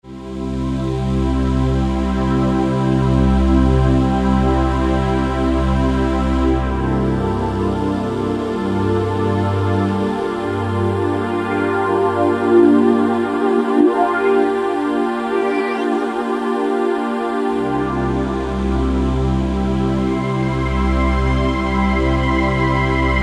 Ambient Pad, Roland SR-JV80-06 Dance

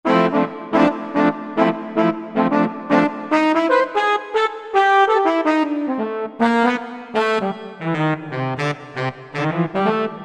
Smoky Horn, Korg 05RW

